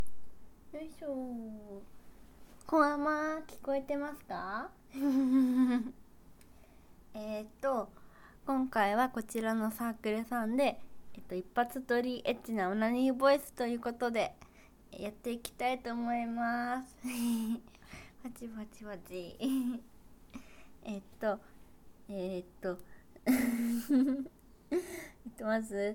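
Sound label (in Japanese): 新人Vtuberがアヘアヘ声でイキ狂った後もイクイクしながら即絶頂オナニー実演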